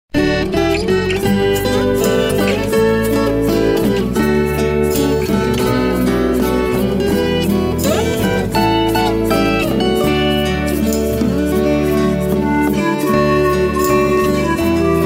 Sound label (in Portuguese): flauta